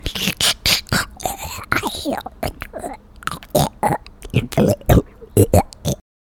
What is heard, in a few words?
Dying Alien